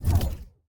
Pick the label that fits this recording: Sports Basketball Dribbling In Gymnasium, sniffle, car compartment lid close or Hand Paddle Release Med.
Hand Paddle Release Med